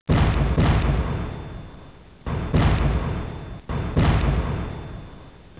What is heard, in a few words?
battle